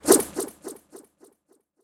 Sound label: jump